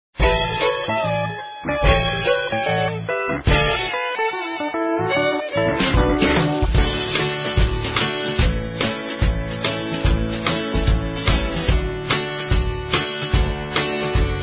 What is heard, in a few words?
background